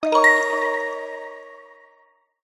multimedia game sound kids warm mellow positive complete